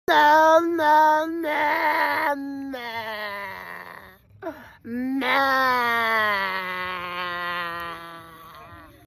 goat and men; audio meme

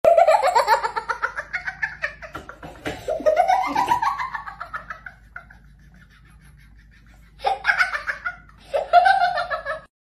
kid is laughing; audio meme

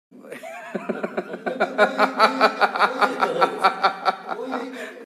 laughing; arvind kejriwal; audio meme

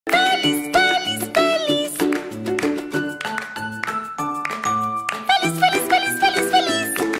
spanish happy happy happy cat; green screen; audio meme

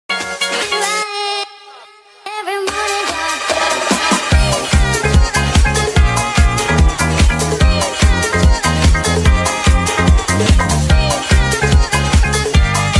sad cat dance; audio meme

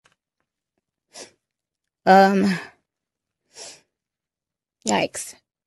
crying; audio meme

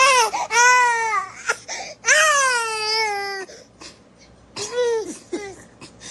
dramatic baby; audio meme

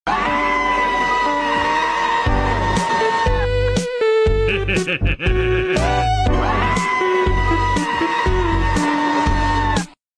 shocked cat looking at phone; green screen; audio meme

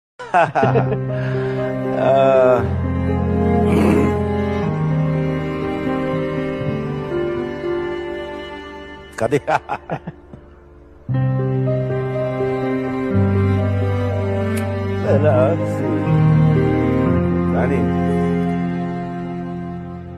laughing sad; rajinikanth; audio meme

